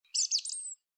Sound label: Bird